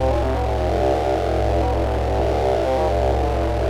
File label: Crawler, Synth Sequences